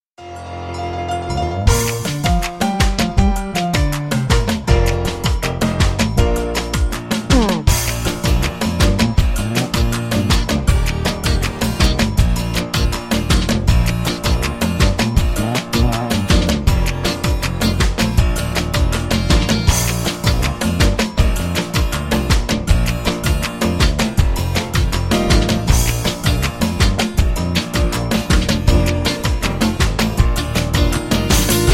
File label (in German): Playback ohne Blaeser; Roll Jordan, Roll